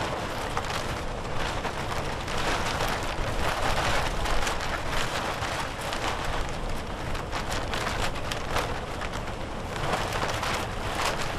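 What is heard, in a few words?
tarp movement plastic close; Titanfall 2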